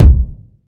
short reverb kick drum sample b key, kick drum tuned to the B note